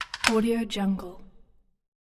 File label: mechanical power plug connect